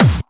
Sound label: snares; Vengeance Samples